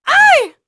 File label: throw; UFC Tapout; XBOX